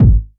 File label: subby kick sound g sharp key, Loudest frequency: 111Hz